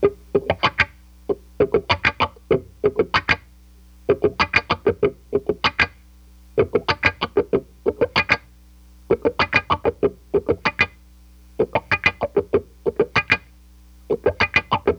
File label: WAH GTR, Funk Gtr & Wah; PlatinumPhattCD2; E-MU Producer Series Vol. 8 – Platinum Phatt